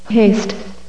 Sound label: haste; q3rally